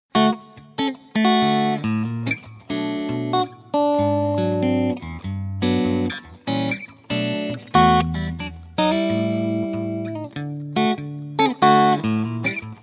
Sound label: guitar